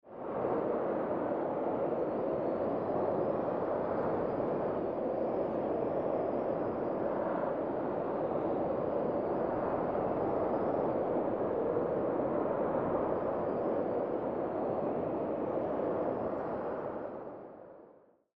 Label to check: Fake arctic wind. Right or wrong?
Right